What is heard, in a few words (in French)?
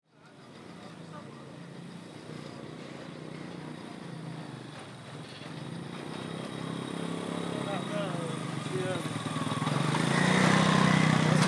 nefta sortie plateau; resonances oasiennes